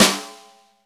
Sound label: snare, Roland; Club-50 - Foundations Roland; Ext.Snare 3